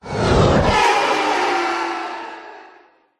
science fiction space vehicle whoosh past

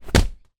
inflatable rubber ring down on hard ground

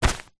foley bag nylon pe grab hand; zapsplat

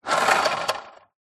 leisure toy lego car push roll carpet

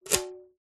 sport tennis racket strings strum fingers; zapsplat

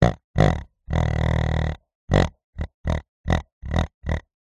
pig grunt designed; animals

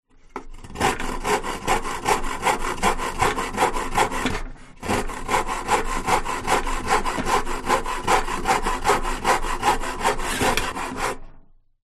Handsaw Bang

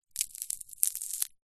food popcorn squeeze crush close up

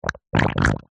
human baby poop wet squelch

